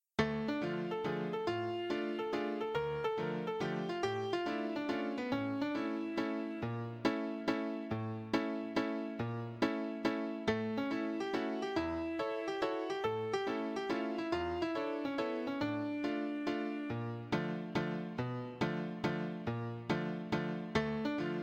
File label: D minor waltz